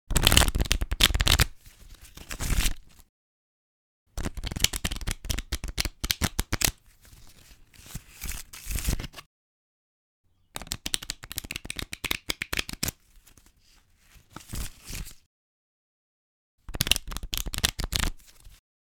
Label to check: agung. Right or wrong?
Wrong